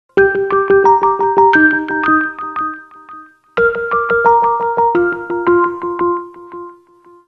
Ring Quiet Bell, Windows Mobile ringtones; Microsoft

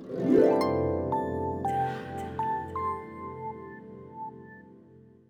Power on classic; Samsung SGH-E500

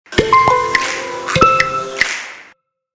Mnemonic; LG; GD570AW-dLite

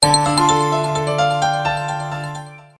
Clear and melodious; Tecno Phantom Pad II (G9)

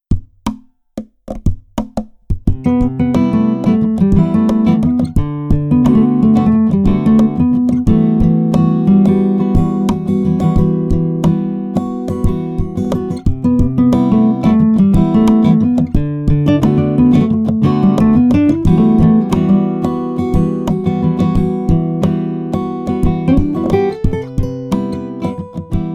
cowboy s love, alarms; ZTE; Nubia RedMagic

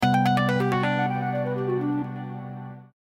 Joke again

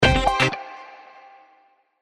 spin hit notification; HTC; House

